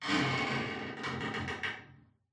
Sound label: wood creaks; Half Life Alyx